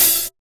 smooth open high hat a key, Royality free open high-hat sound tuned to the A note